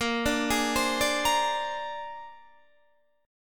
Bb 9th Bb down Guitar DADGAD

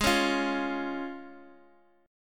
Db Major Ab

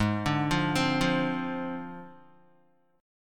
Ab 6th Flat 5th down Guitar; Ab6b5 arpeggiated

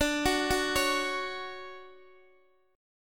D-Major 7th, D, Guitar, Open D

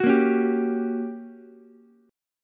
G Minor Major 7th Flat 5th, G, down, Ukulele; strummed; JGuitar

